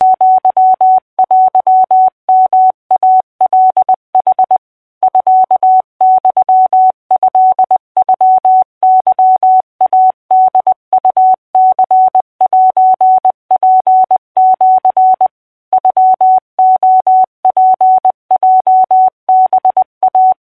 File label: 20wpm correct